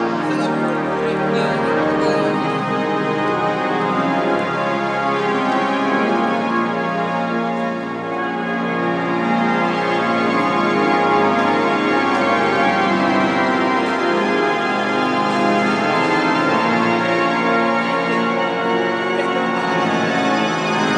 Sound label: organ playing; in macy's